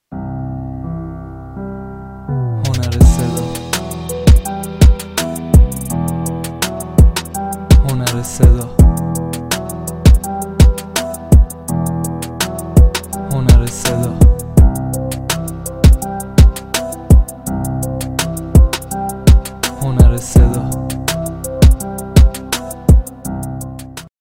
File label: beat